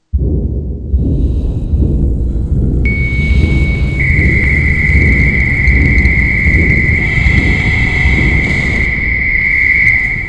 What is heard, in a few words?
pulse